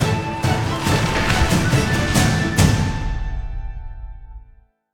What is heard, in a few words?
chest blue; adelia The Fortune Wielder; Foxium